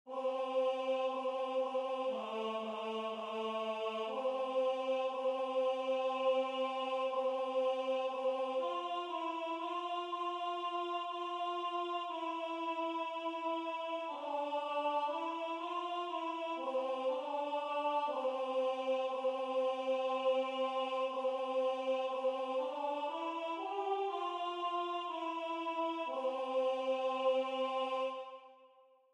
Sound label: Alto